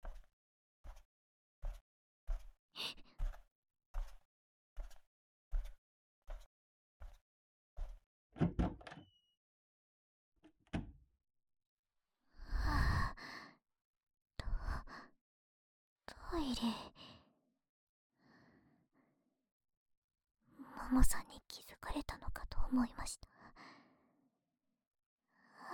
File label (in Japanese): 【左右】布団の中で耳キスパート, ASMR